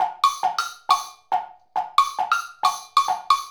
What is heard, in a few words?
Wood Blocks and Bells